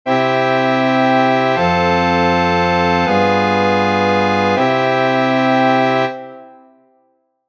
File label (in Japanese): 属九（順固有）の和音